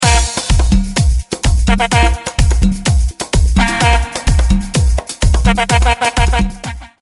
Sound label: Brazillian World Cup Carnival